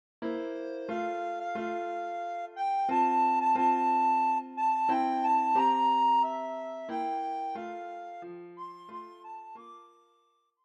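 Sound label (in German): O Tannenbaum Blockflöte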